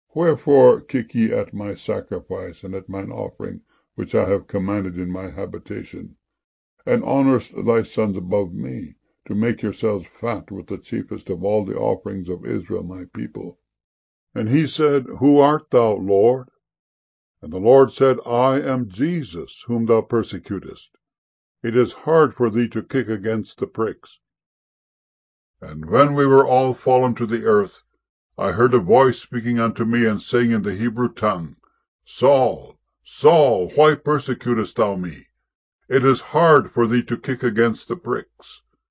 kick

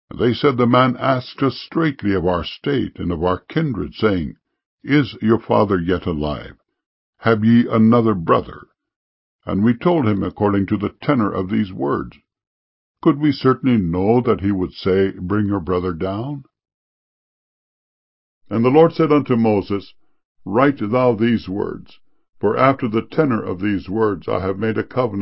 tenor

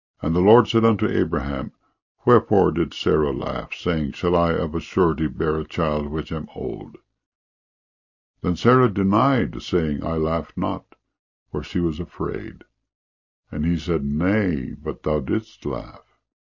laugh

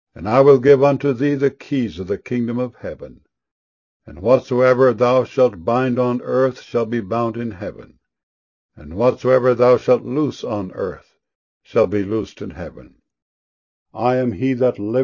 keys

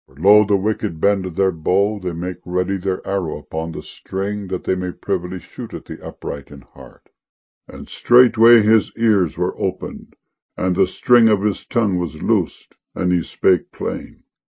string

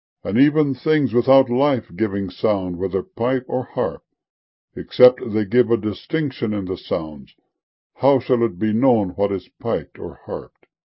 harped

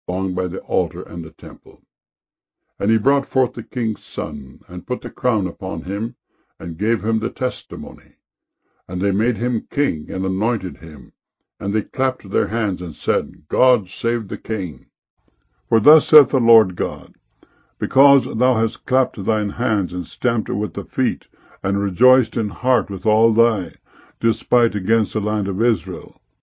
clapped

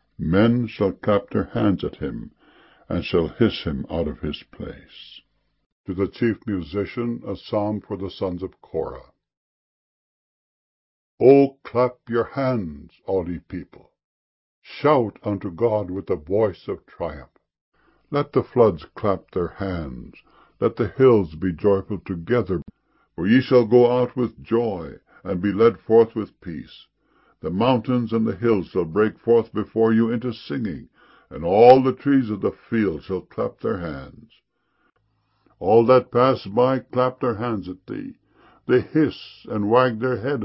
clap

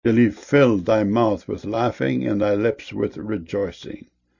laughing

